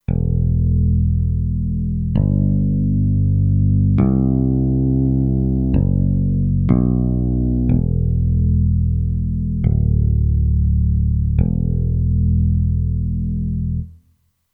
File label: Sustain E Bass, Kurzweil K2500X; Factory Programs